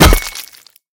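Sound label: zvonkij yasnij razrubayushij zvuk, ringing clear chopping sound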